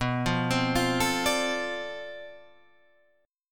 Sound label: B Minor 7th Flat 9th Flat 5th B, guitar chord